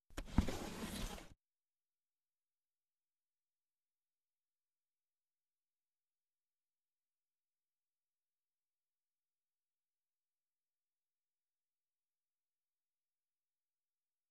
優しくこしょこしょしちゃいますね（梵天耳かき、右耳）; この世の果ての風俗店『閑かの艶歌』～囁き上手なケモミミっ娘の、甘やかしご奉仕♪～

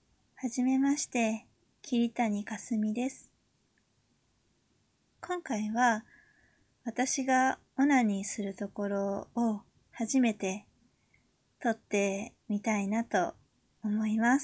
初 ガチ 実演 オナニー; THE FIRST ORGASM; オモチャ

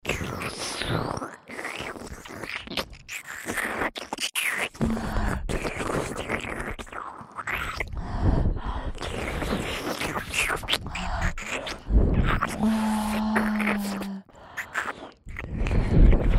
ねっとり両耳舐めループ, ASMR; エルフの女王、メス豚堕ち

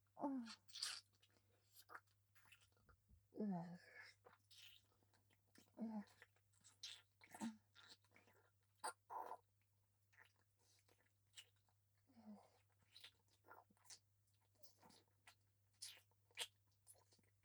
乳首舐め; ミズキ

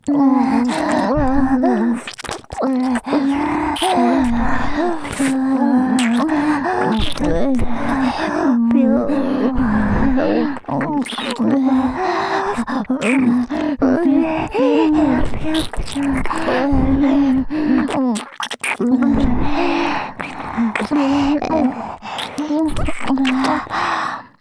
『ぴゅっぴゅ』あり両耳舐め(ループ対応)

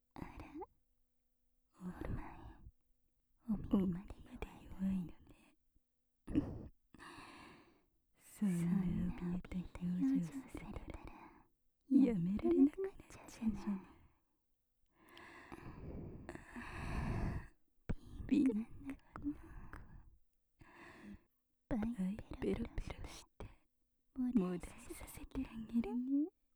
インターバル W 耳舐め; 両耳女王様; 昇天屈従マゾハーレム

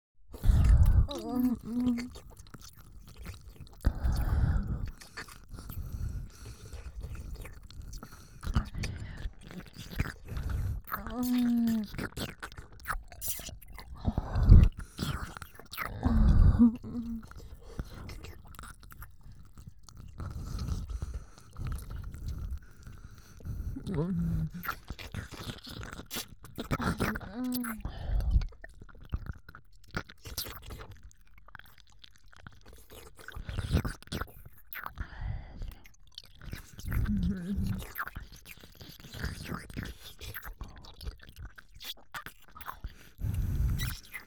キス＆耳舐め, ASMR; OL×痴女

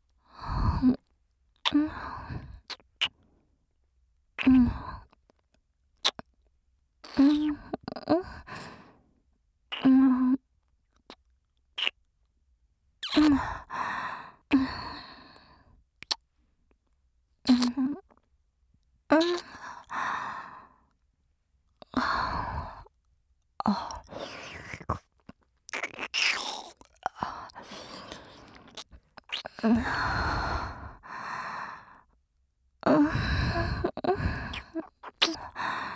左右交互耳舐め

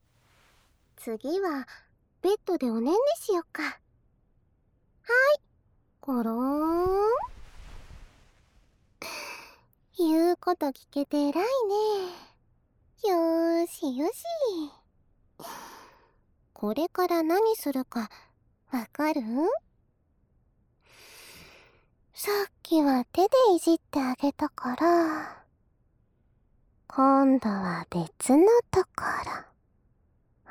耳舐めひざ裏コキ; 幸せになってきたね♡

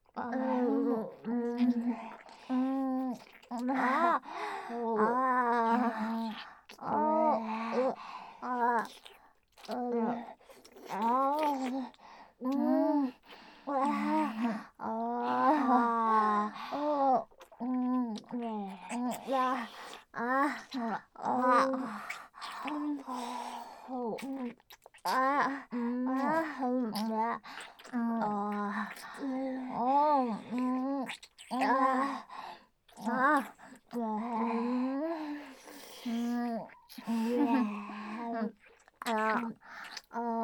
取り合いフェラ(ループ対応)

